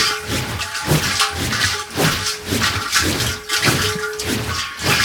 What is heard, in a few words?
Mech Oil Canister Loop; Warframe; Nechro Mech; Abilities